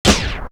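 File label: Turntable; AMG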